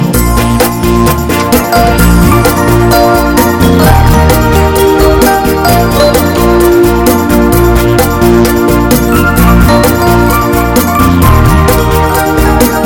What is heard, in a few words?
Hot Dancing Sounds